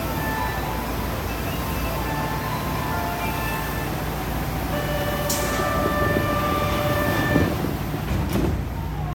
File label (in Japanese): 京都市営地下鉄東西線陵東